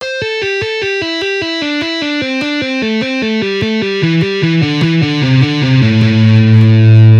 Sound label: Hammer ons, guitar